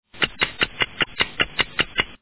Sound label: clock